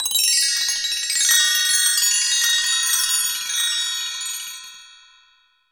bell tree, Bells+Chimes; NorthStar - Global Instruments VOL-2

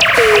ELECT FX; USB Soundscan vol.27; Ragga & Dub Reggae; AKAI

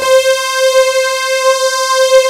FUZZY LEAD; Pro-Rec - Synthsonic; TRINITY

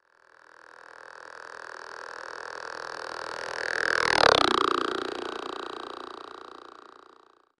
VOCODER; AKAI Anime Sound FX; NATURE

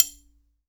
F TRIGL CL, triangle; TRIANGLE2

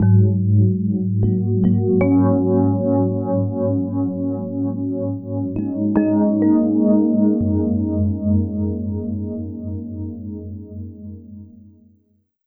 FM Chords, Keys; Sonic Foundry (Sony Creative Software); Slow Silhouette

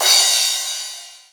CRASH, DrumBase5000; Crypton; CFZ KIT 2

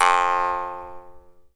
jaw harp, Pitched Percussn, Jaw Harps

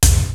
METAL DRUMS; Kid Nepro; Elite

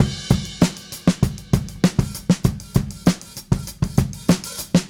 Smooth Groove Drums Crash, 70s Analog Funk; Sonic Foundry (Sony Creative Software); What it Is!

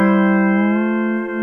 PEDAL STEEL

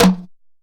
JUNKU HITS; Best Service; World Colours

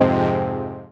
Fm Dub Chord

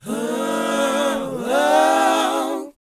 BOYZ B, Vocal Planet R&B & Dance; ILIO

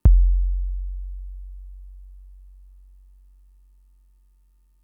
Kick, Drum Machines Fricke MFB-522

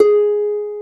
concert harp G#3; InVision Interactive; Lightware VOL-1; Instruments & Percussions

